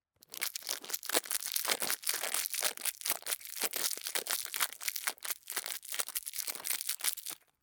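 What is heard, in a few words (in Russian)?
звук шелеста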